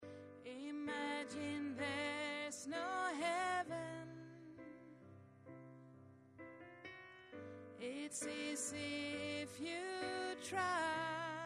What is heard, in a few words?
tenor